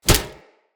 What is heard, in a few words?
Unarmed Punch Impact Flesh Armour Heavy; Weapon Impacts; Player; Combat